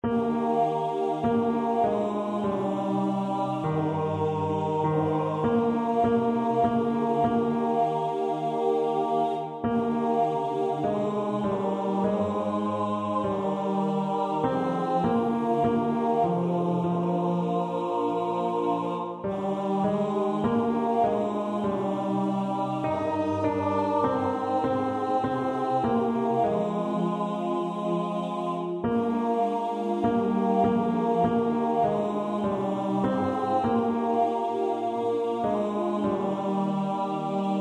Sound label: Abide with Me Tenors rehearsal track